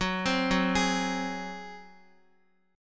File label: Gb Suspended 2nd Flat 5th Guitar 4F,4A,5C,5G,5C,6E; arpeggiated; JGuitar